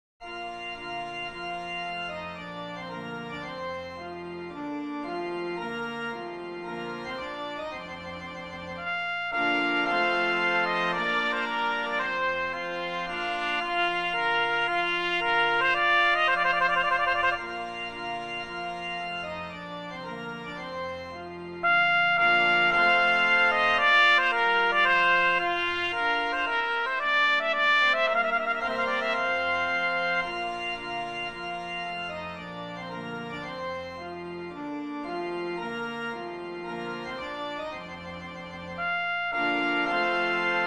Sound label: trumpet tune; Jeremiah Clarke